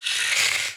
Female zombie; Zomboid 41.78.16; Project Zomboid

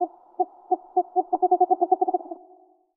Owl; Zomboid 41.78.16; Project Zomboid

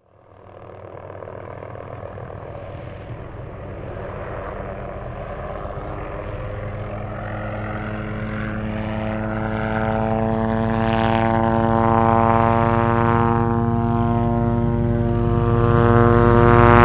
chopper; Zomboid 41.78.16; Project Zomboid

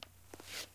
zombie footstep; Zomboid 41.78.16; Project Zomboid

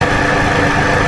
car med

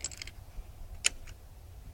bullet In Rifle; Zomboid 41.78.16; Project Zomboid

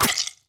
Head Stab; Zomboid 41.78.16; Project Zomboid

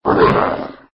zombie hit; Zomboid 41.78.16; Project Zomboid

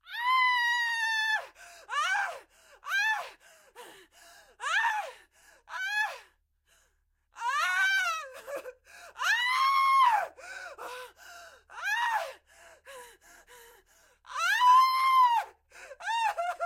dist scream; Zomboid 41.78.16; Project Zomboid

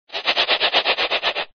scraping Wood; Zomboid 41.78.16; Project Zomboid

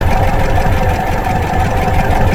car idle

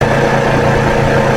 car fast

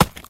Chop Tree; Zomboid 41.78.16; Project Zomboid

